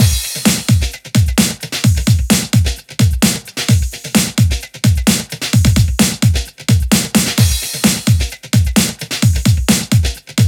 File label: Bit